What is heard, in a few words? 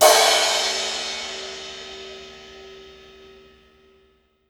Crash Long; pitchglitch samples